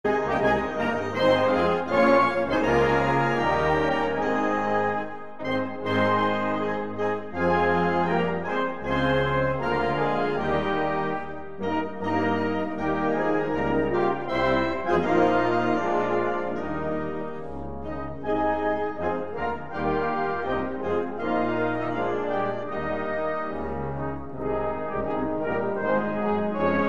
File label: swedish anthem ringtone